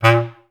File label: BCS STAC A#1; Best Service; Peter Siedlaczek's Advanced Orchestra